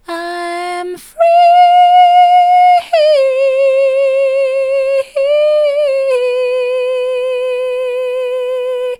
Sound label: i'm free soft, vocal